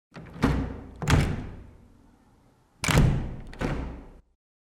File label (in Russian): zvuk otkrytyh okon